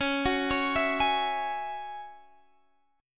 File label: F sharp 7th Suspended 2nd C sharp